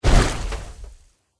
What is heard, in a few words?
water walk, power armor; clothing system